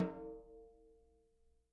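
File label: jazz snares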